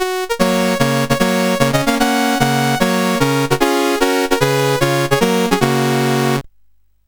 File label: menus, sid CSG8580R5; Games Summer Edition